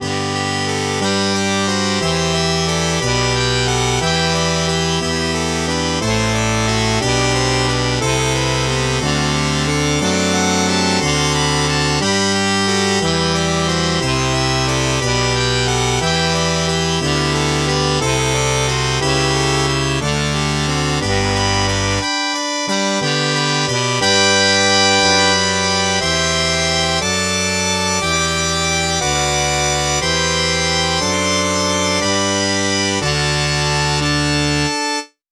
muted trumpet

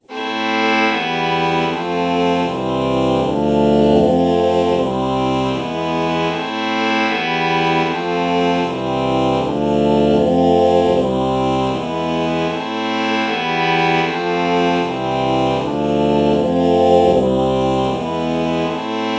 oh violin chord morph

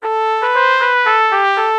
oh trumpet lpc false melody morph

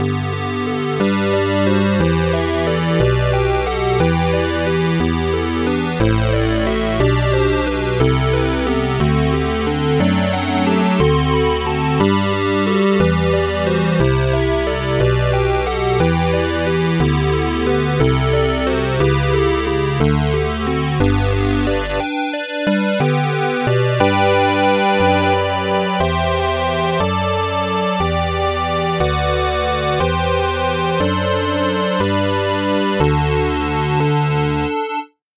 square lead

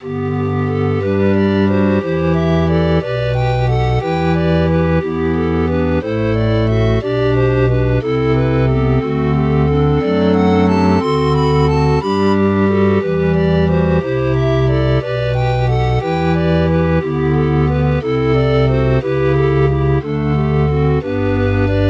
pan flute

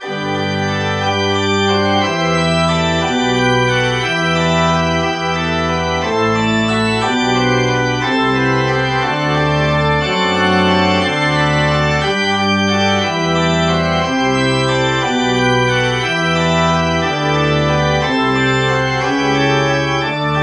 church organ

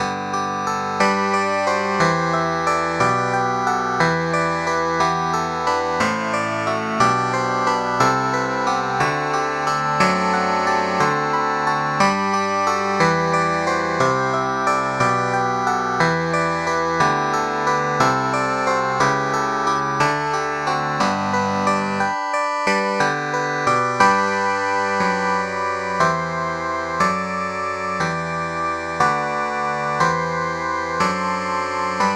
clavinet; SpectMorph Evaluation #4

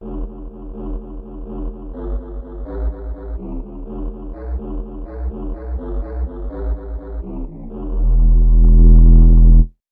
pan flute bass

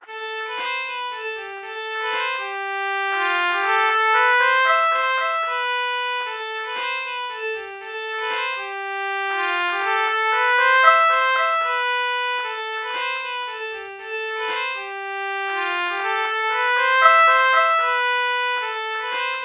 trumpet violin melody morph

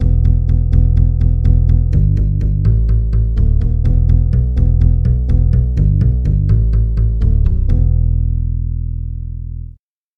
acoustic bass bass samples

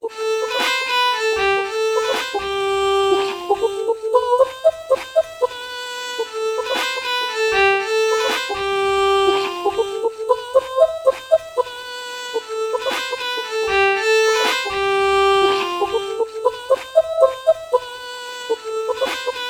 oh violin melody morph

